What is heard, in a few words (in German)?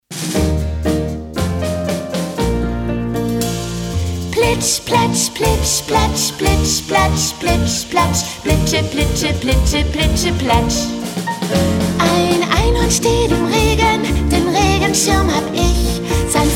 Sopran